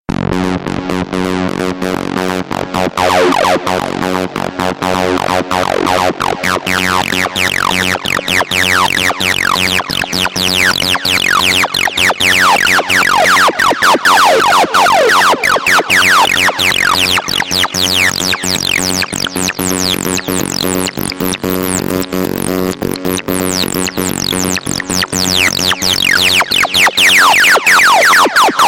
3D Beats Ringtone

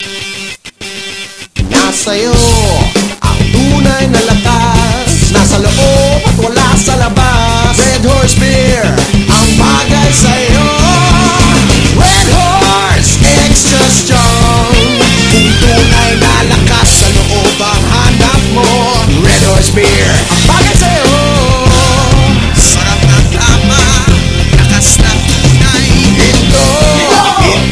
beer theme; red horse

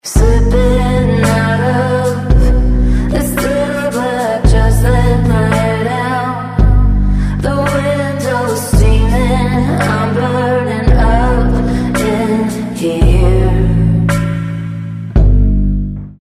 TV ad English song; Artize Rainjoy

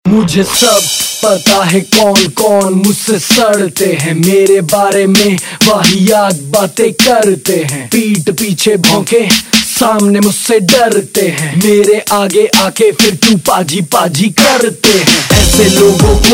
RAP Ringtone; Indian Pop

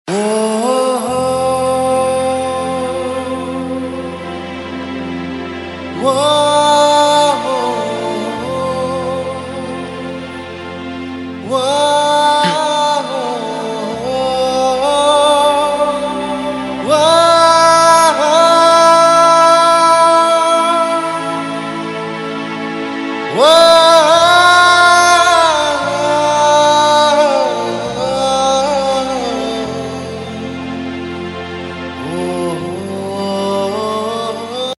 Sad BG Tune; Zee TV

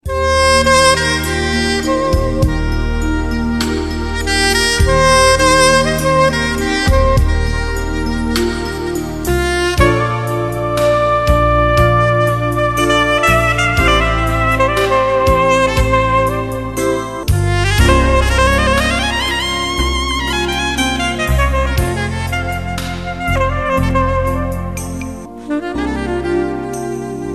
Royal Ringtone

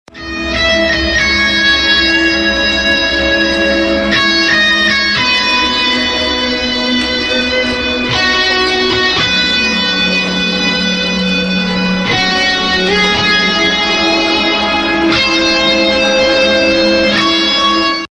Guitar Instrumental; TV Serial Tone; Star Plus

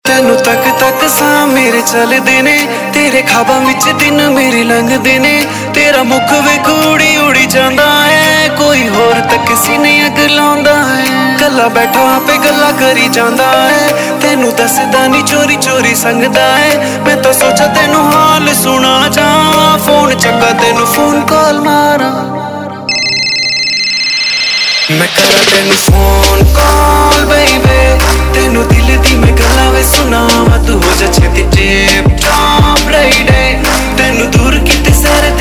Phone Call

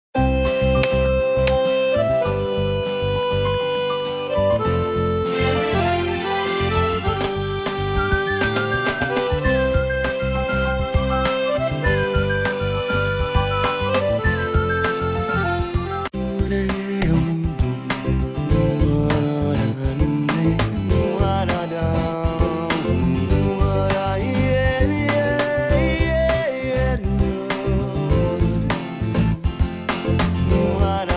Bg Tune; Tv Serials; Zee TV; Qubool Hai

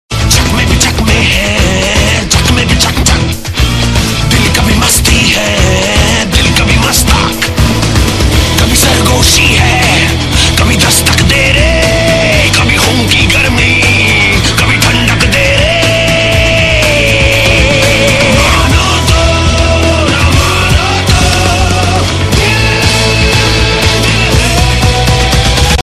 Full Tone; Bollywood; 7 Khoon Maaf (2011)

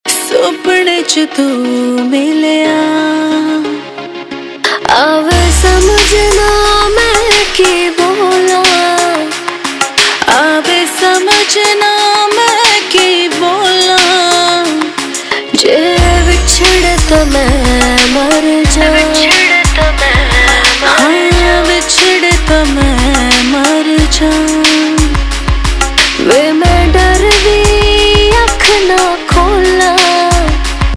Sufi Sparrows Ringtone; Punjabi

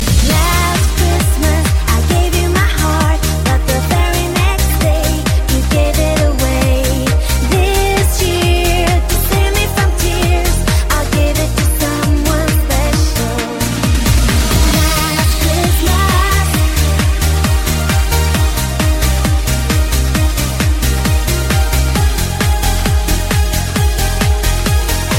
Dj Christmas Beats; Christmas Special

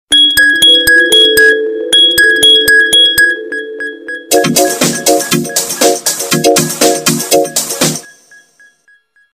Dramatic Sms

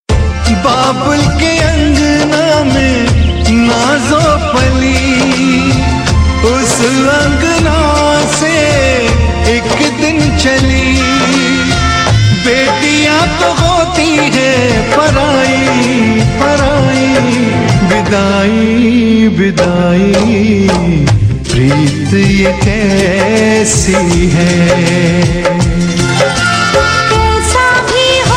Bidaai Sad Ring

